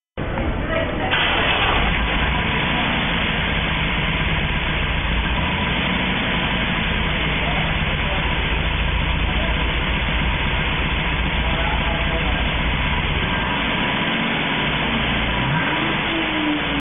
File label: 70 deuce, exhaust systems